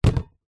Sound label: household kitchen knife metal set down on cardboard box; zapsplat